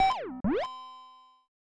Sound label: Unused Jingle; mario paint game rip